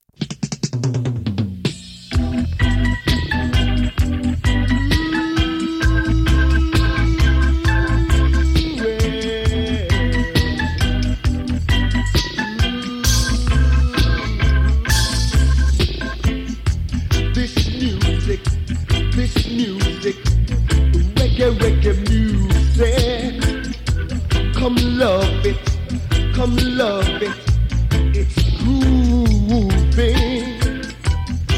Reggae Music

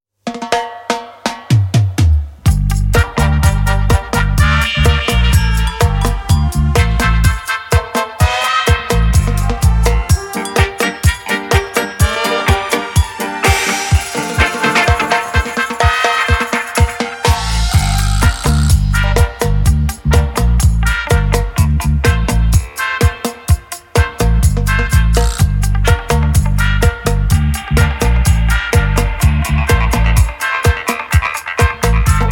Dub, reggae; roots

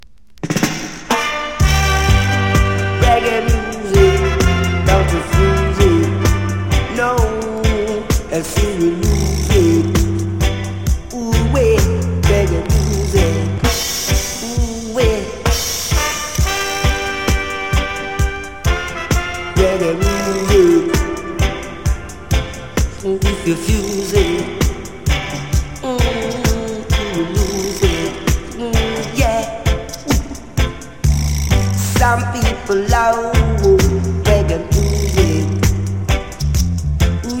Reggae Music

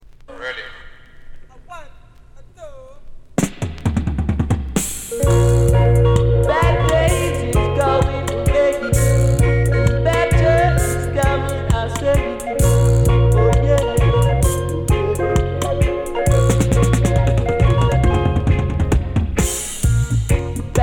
Disco Cut, reggae; roots

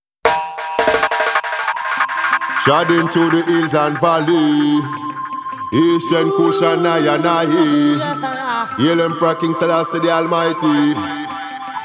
Bingi Bongo Dub

